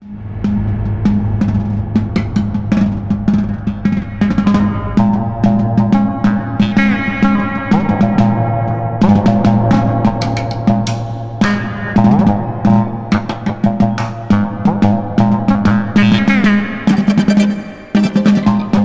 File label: snare